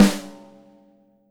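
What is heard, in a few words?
Jazz kit snare drum, Drums Acoustic; USB Soundscan vol.10; AKAI; JAZZKIT 2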